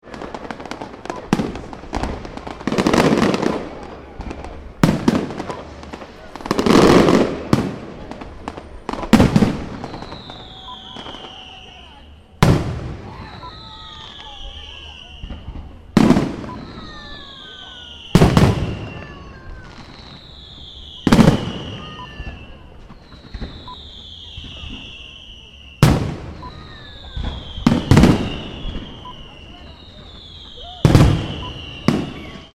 Fireworks Display

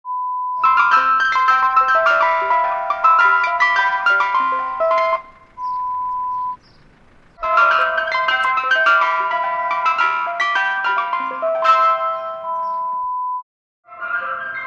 Ice Cream Van Collection

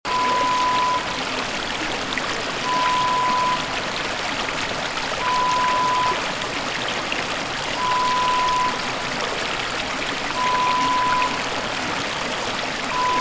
Babbling brook

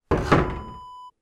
water basin set down

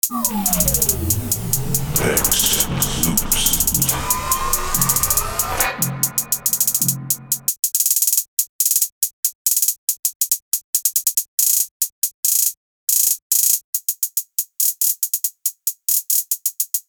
trap essentials hihats

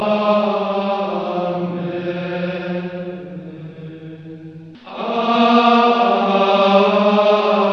amen

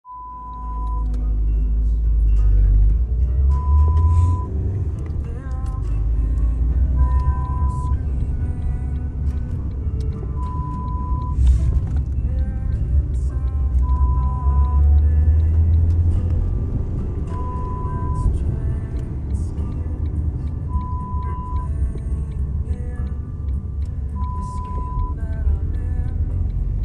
taxi cab sound

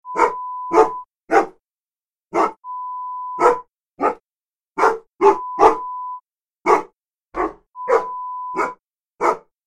Dog Barking sound

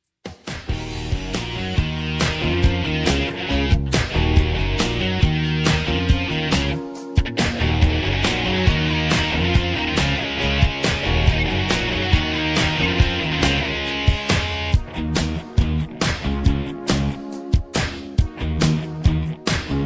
Key Of E Flat Minor

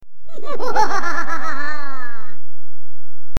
Psycho Ghost Laugh

